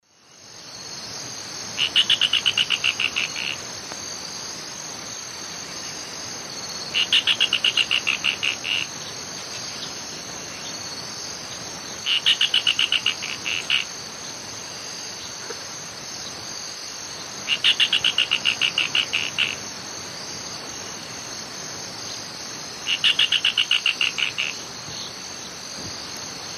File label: Synallaxis propinqua, song; S. America; Eastern Lowlands